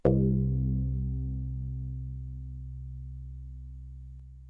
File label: bong